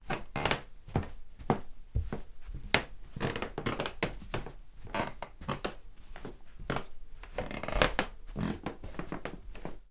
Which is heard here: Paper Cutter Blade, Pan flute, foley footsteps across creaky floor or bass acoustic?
foley footsteps across creaky floor